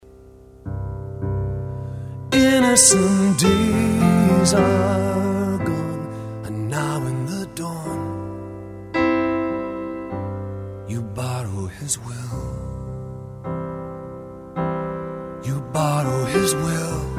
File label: Piano